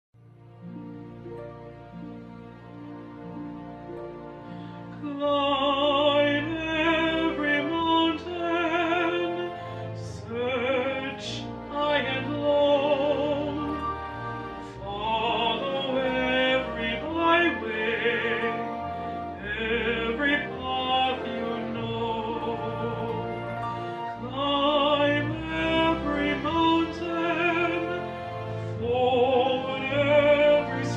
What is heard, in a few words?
Musical Theatre, singing; Broadway Collab